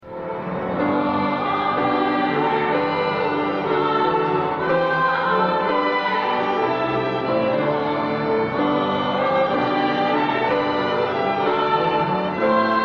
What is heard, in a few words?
Celtic Alleluia, string quartet